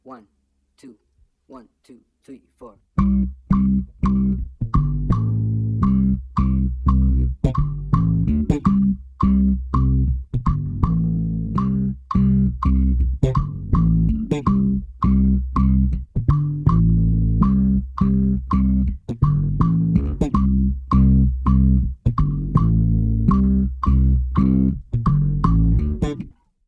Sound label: bass guaguanco 3 3 2 new orleans feel